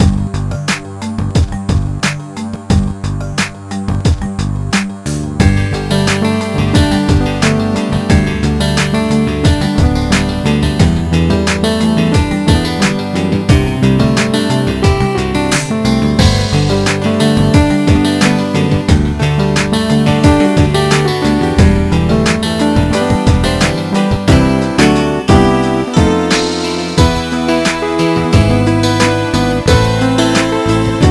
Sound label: Cave; Hannah Montana Pop Star Exclusive; unreleased ds gamerip 2008